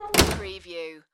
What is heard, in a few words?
Auto Hood Close; sound effects bible